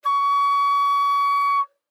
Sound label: Flute nonvib ff Db6 stereo; Woodwinds